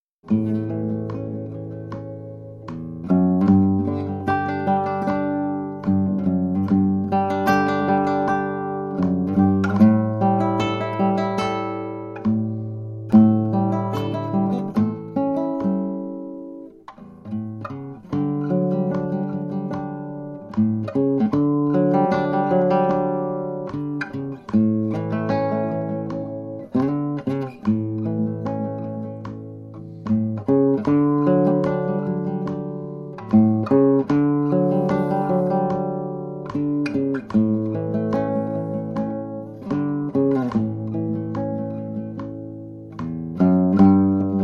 Solo Meditation

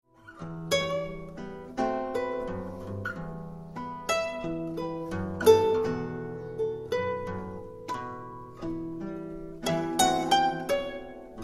Trio Impro Fado

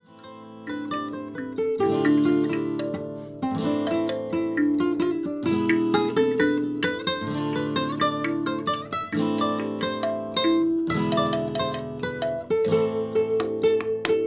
Trio Blues

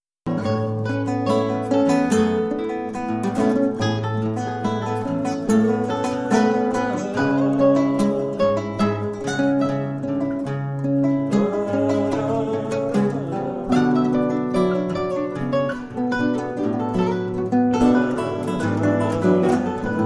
Trio Impro

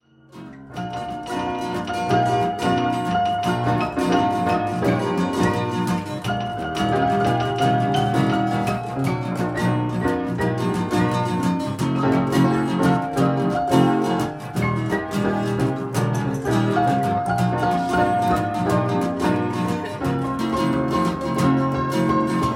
Trio Blues Boogieblues

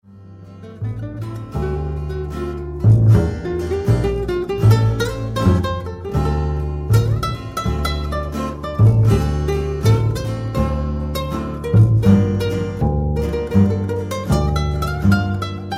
Trio Impro

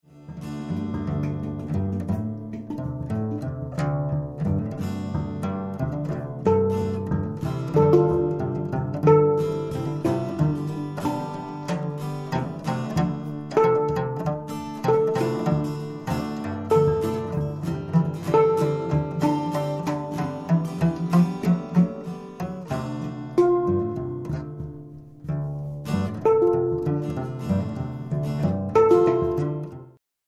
Trio Impro

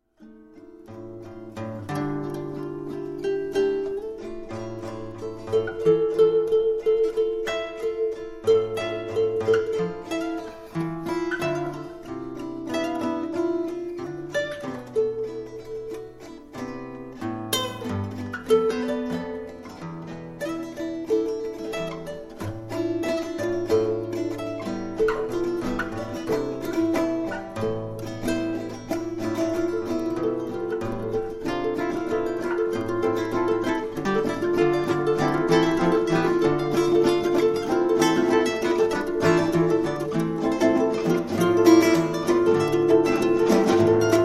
Trio Impro Fado

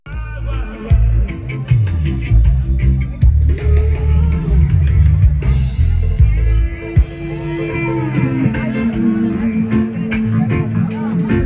reggae; Wapelreggae 2018

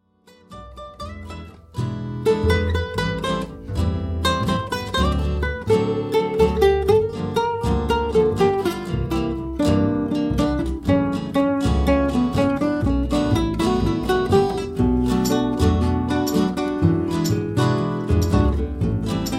Trio Blues Sambablues

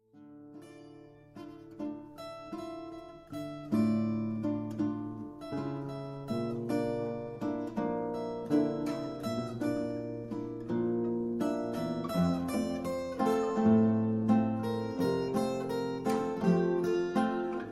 Trio Impro

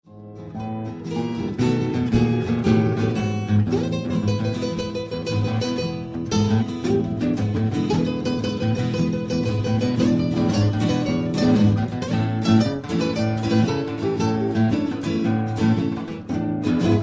Trio Blues

